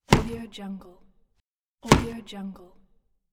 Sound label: tennis ball hits